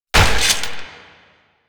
Nagantaka Prime Shot 2D, weapons; Warframe

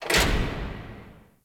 Worker Lever Push; Warframe; Venus Open World; Venus Solaris United

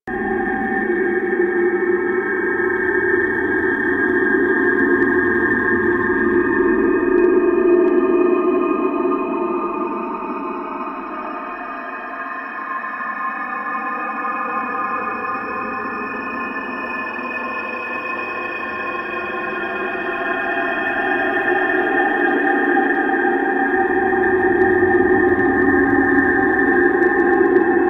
Space Exterior Amb; Ambience; Space Battles; Warframe

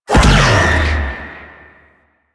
Whirlwind Dash Empowered Impact; Warframe; Caliban

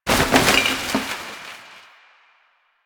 garbage bag destroy

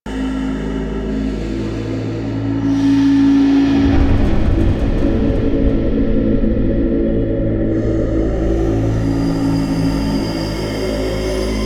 Interior Vents Bed Loop; Warframe; Ambience; Entrati

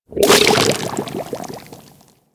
Settlement Oil Bubble Burst; Ambience; Warframe; Grineer Settlement

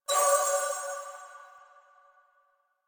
Choir Bow Charge Full; Warframe; Weapons

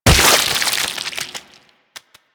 Pumpkin Splat; Warframe

